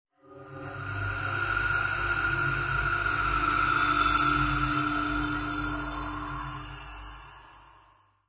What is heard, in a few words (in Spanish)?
suspenso